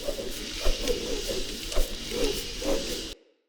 Claw Proj Loop; Enemy; Corrupted Sproutfolk; Combat

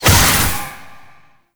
Corruption Pulse; Enemies; Abilities; Warframe; Zariman; Void Angel

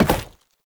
Step Glass; Warframe; Oraxia; Foley

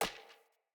Hunter Bow Grab; Weapons; Player; Bows; EnvoyHunterBowRangedMelee

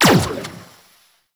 Shot Fire; Warframe; Weapons; Akarius Prime

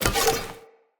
Player Torch Equip; Weapons; Blunt

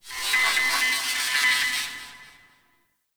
Corpus Ship Data Rand, Ambience; Warframe; Corpus Ship Remaster

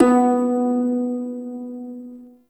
Prime Shawzin Oct One B; Warframe

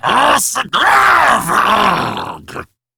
Attack, Battle Barks

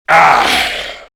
Death Grineer Male; Warframe; Battle Barks

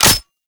Sniper Mech; Warframe; Frumentarius

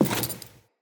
Walk Default, Footsteps; Enemy; Lady Deora

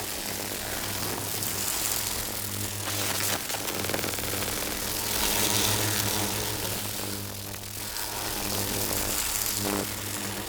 Alchemy Grenade Hold Loop Electricity; Entrati

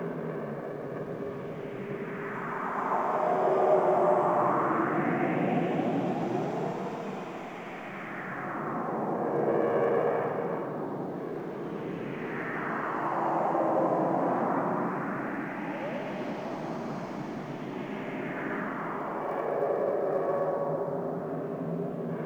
Infested Eris Interior Cave Small Bed Loop

